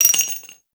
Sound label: Shell; Dual Vipers; Weapons